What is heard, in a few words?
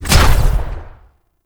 Fire; Grimoire